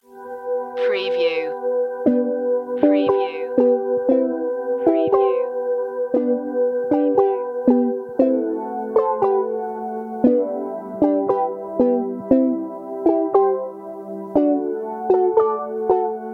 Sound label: gentle rain no lead